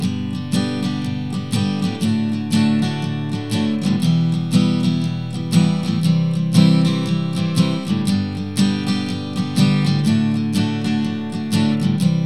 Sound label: G, ukulele